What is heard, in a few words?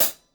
Hat Closed Hard; hydrogen drum samples